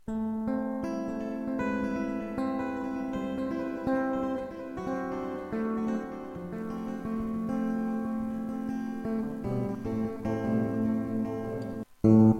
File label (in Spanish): eco, guitarra